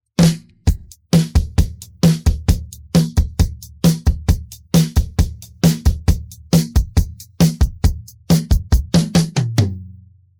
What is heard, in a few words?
pattern, drums